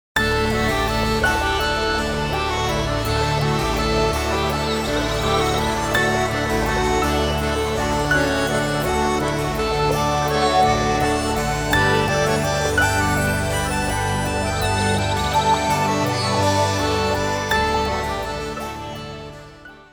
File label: Tranquil videoringtone; Nokia; audio themes